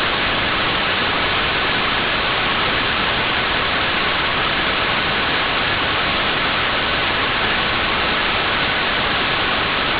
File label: horus binary ebno 4.5db; horusdemodlib